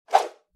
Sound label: whoosh effect